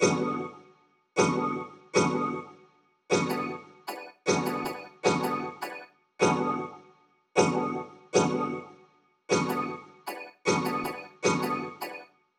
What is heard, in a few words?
Icy Stabs, Cinematic Trailer Loops